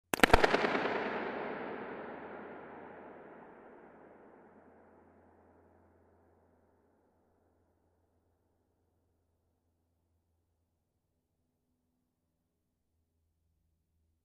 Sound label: balloon burst in centre of dome with mic at focus; Trevor Cox; SoundBook